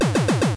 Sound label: door; Pizza Tower early build test ost